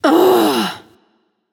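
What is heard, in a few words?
ugh, female; smite; voicepack; hera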